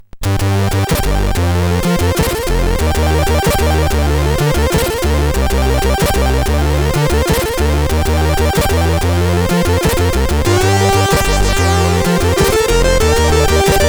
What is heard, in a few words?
sid CSG 8580R5; Warming Up